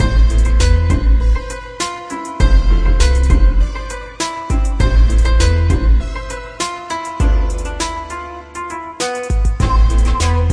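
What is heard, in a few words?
BGM mahjong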